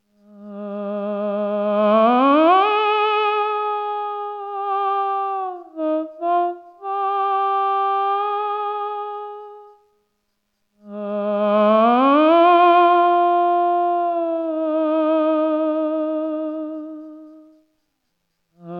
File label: tenor